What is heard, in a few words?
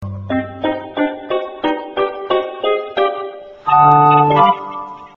Funny Music Msg